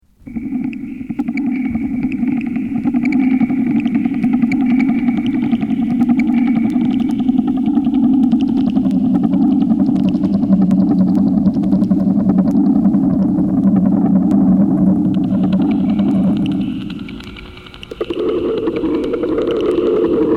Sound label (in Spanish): musica electroacustica espanola